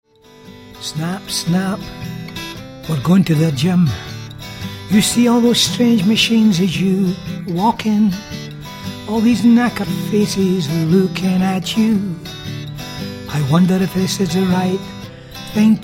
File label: male acoustic